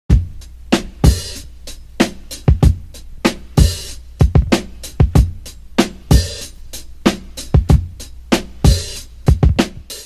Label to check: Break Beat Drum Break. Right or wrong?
Right